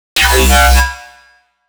fluctuate, bass

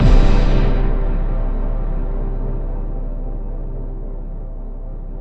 JV-1080

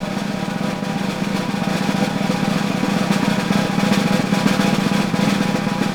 snare, Roland; LA Composer

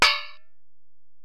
Ac Perc, Drum Machines Alesis DM-PRO

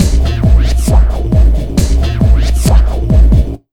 Big Beat Drumloops, 135

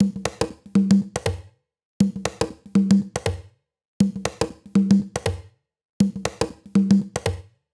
Conga Loop 120, Perc Loops, Loungin House; Equipped Music

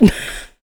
LAUGH VOX; USB Soundscan vol.18

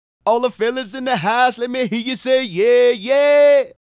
Male Solo, Hip-Hop and RnB Vocals

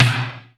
GERMAN SNR, RY30 KIT; Crypton - DrumBase5000

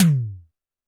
TD-20 Tom A, Roland TD-20 Toms

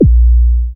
BD ANALOG; Sound & Vision - Gigapack II CD 2

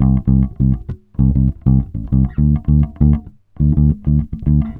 SOUL 100 D, Bass Grooves; USB Soundscan vol.30; AKAI

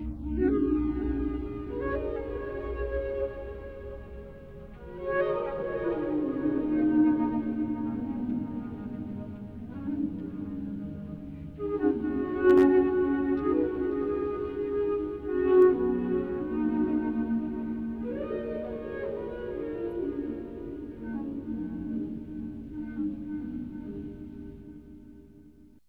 GHOST FLUT, AMB SOUNDS

